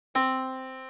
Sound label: Yamaha Disk, piano sound